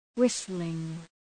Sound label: whistling